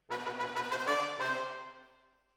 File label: Horns Over